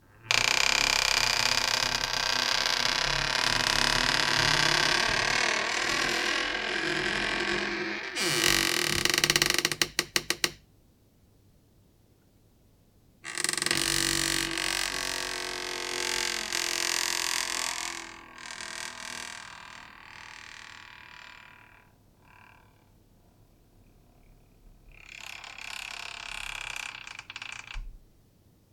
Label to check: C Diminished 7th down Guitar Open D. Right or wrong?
Wrong